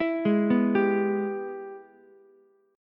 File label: Ab Minor Major 7th Sharp 5th chord, Ukulele; arpeggiated; JGuitar